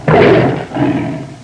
Jungle leopard loud roar

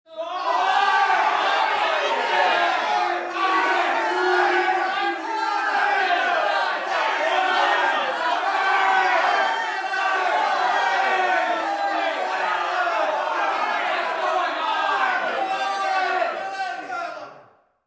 Crowd disagreeing

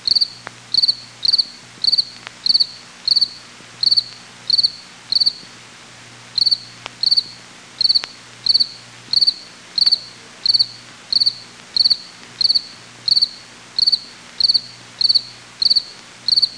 Cricket sounds

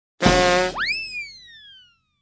Comedy Horn with cartoon swish

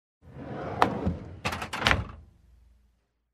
New Drawer closing

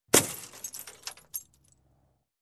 Small Glass shattering

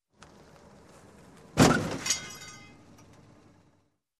Car Bump with Debris Fallen Sound

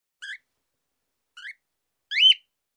Bird chirp in the morning

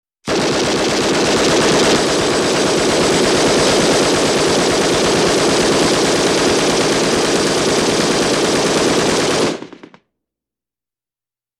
Gatling Gun

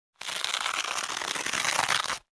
Tearing off wallpaper

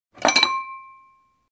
Dishes clinking in shelf

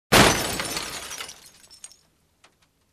Broken Glass

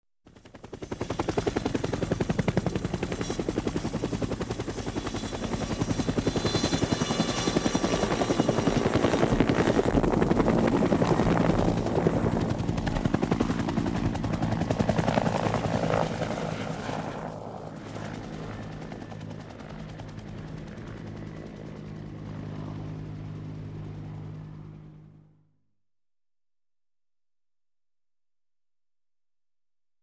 Helicopter landing on Helipad

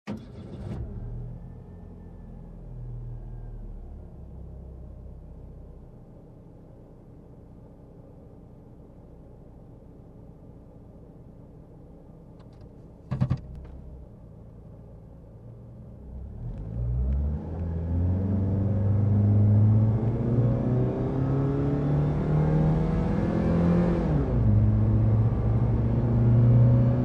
car engine start and speeding

